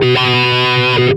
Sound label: dist wah B2, Guitar Special, distorted wah, notes; Future Music 10th Birthday 2002